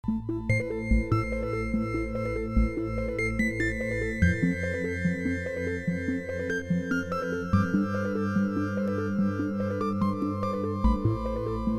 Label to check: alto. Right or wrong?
Wrong